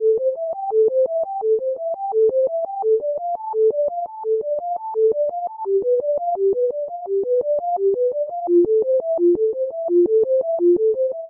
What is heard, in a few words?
Glass Chimes A Minor 85 bpm; Accompaniment; Tonal; MIDI Clips; Drive and Glow; Ableton; Factory Packs